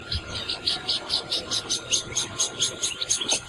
Java Sparrow juvenile bamboo seeds calls; Amar

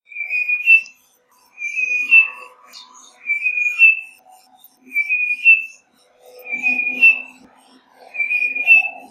Large Niltava warning calls; Cameron Highlands Malaysia

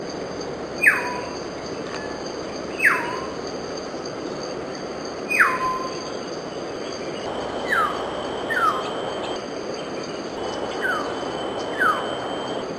Hill Myna Tiong Call adult with possible juvenile; Amar

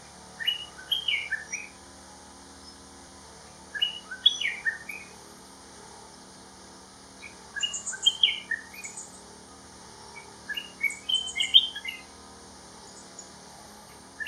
Olive-winged Bulbul calls; Kledang Sayong Forest Reserve Ipoh Perak Malaysia

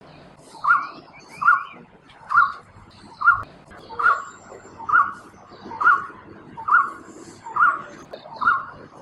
Golden Throated Barbet calls; Amar

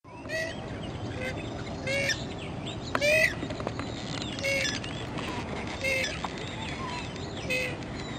Purple Swamphen calls

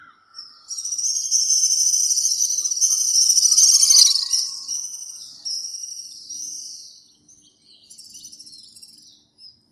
House Swift calls, Ipoh Perak Malaysia

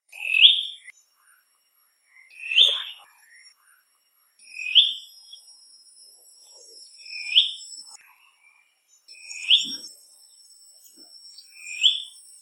Dark necked Tailorbird calls, Sabah Borneo; RDC Sepilok Sandakan

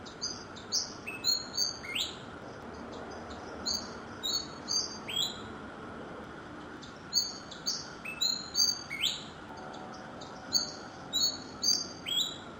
Pied Fantail Flycatcher call; Amar

